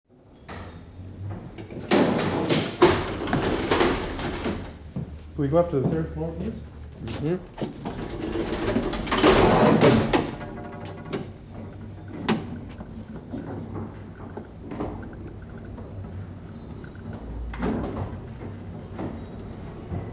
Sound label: Elevator